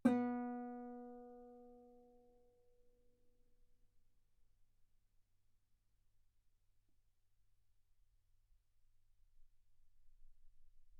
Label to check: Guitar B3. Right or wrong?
Right